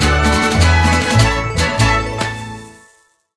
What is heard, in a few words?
large Win; colossal pinatas